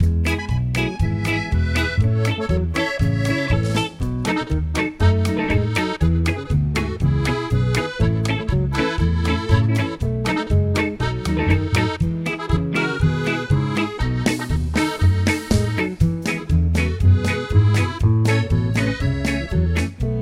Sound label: Latin Juarez Mariachi Tex Mex Polka 120 bpm